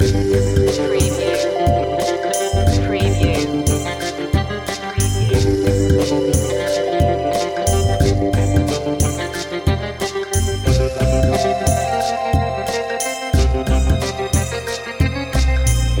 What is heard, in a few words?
rat trap loop